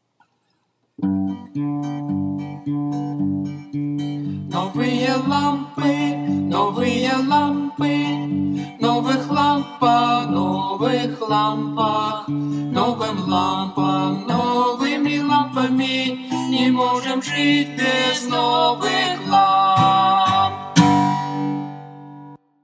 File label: Song, Russian Songs